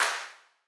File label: Clap; XO The Host